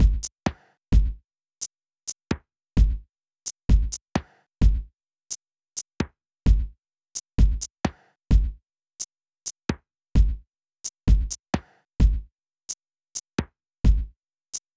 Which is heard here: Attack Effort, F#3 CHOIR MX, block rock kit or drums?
drums